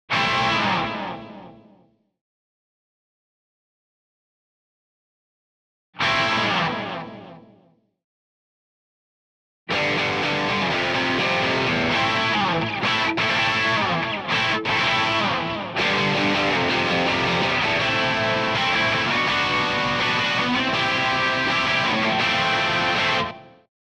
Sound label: c gtr